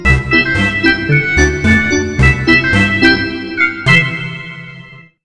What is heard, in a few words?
circus